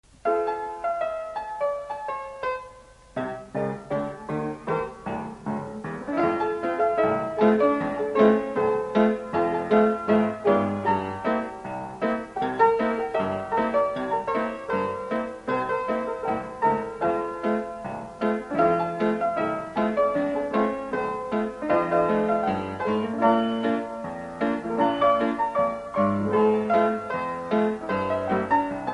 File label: calliope